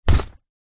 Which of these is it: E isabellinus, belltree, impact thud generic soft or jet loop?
impact thud generic soft